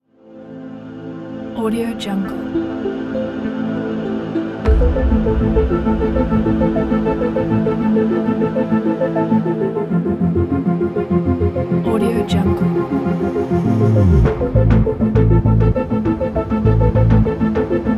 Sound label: mistery criminal